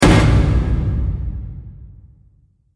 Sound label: Explosion Impact Hit, bomb